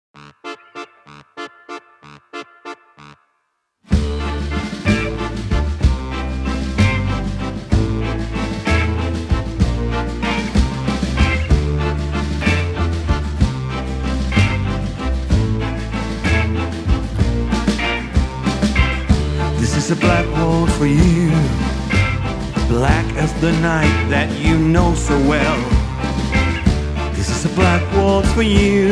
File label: Black Waltz